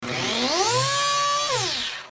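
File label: Mechanism Fast Hi Rev, servos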